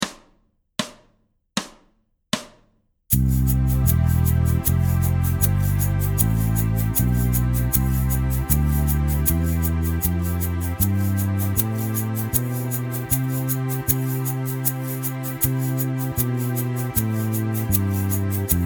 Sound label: C major Pop 78bpm